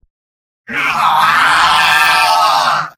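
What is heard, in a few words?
spy pain critical death; tf; mvm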